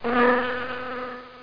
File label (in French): mouche; Reperages 94; tortue